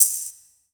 One Shot Shaker, Drum N Bass - Percussion; Sonic Foundry (Sony Creative Software); Knutrix Drum & Bass

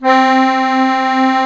HOHNER, akkordeon

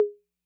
Congas Hi Accent Med Decay, 808

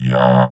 VOX VOX, Electro Vox

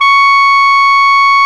Clarinets

